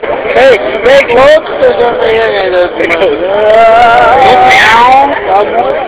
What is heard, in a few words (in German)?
miau